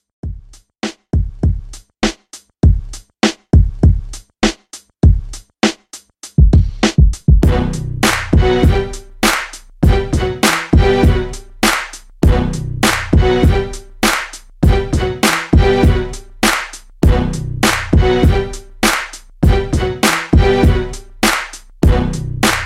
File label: Old School, 100Bpm; Redrum